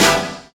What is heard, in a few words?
HIT Band, Big Band Hits